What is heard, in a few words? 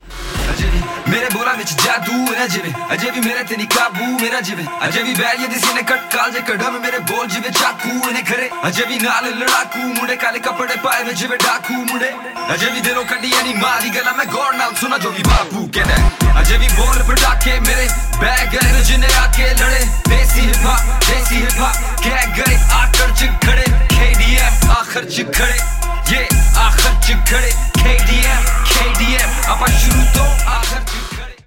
Rap Punjabi Ringtone